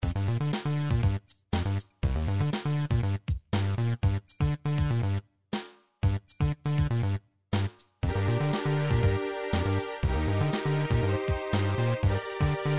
strings, midi

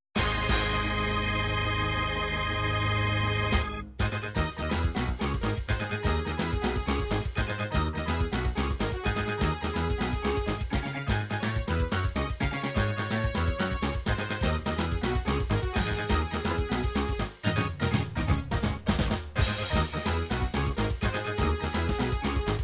dance techno, midi

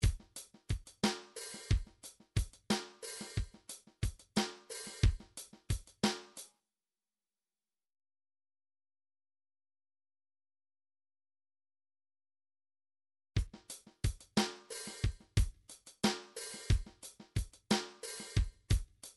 drums, midi

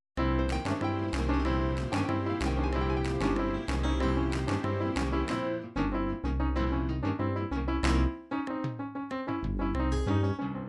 tango, midi

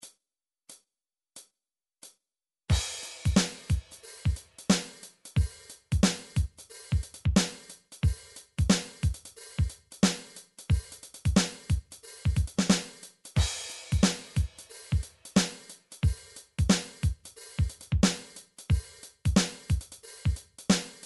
funky; song book

